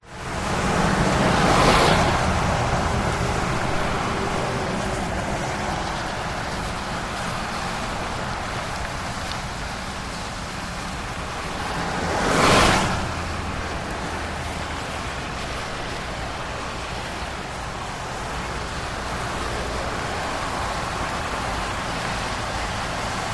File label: traffic highway 30 metres away rain close cars pass sliproad; transport